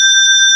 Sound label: BELL WAVE; AKAI S6000 CD-ROM - Volume 1; SAMPLE WAVE MIXING 1; 128 PRO SYN